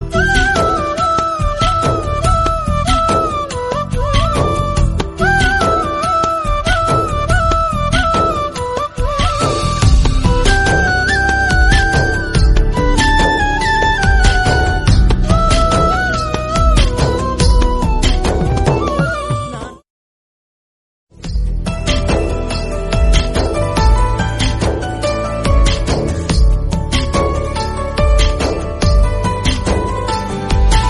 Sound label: Flute Ringtone